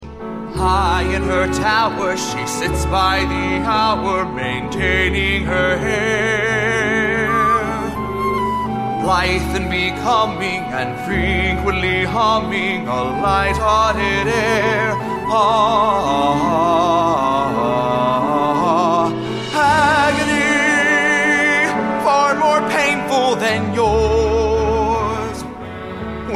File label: agony with vocals; audition